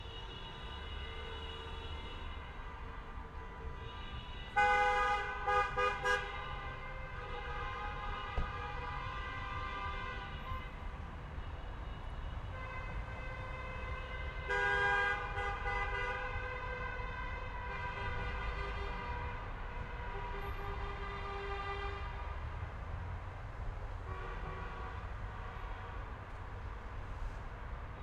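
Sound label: car protests ambient - EXTERIOR - distant horns protest ending stereo back; SoundHolder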